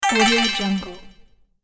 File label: recharge health